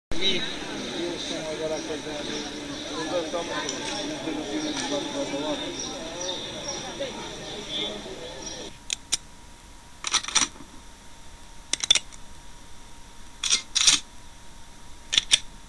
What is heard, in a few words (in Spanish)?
radio sin palabras